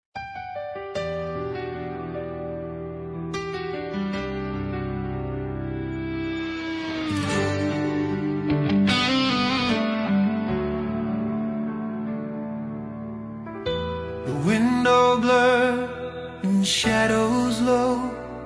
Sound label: Rock Romantico